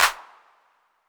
Clap 808 Saturated A, Hand Clap Multi Saturated

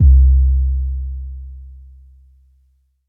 C Deep Bass Hit

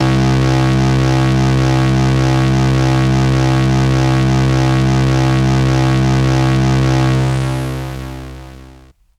MS20 Fuzz Mod Vinyl Synths C1, bass

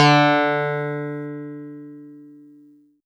Easy Clav DX100 D3

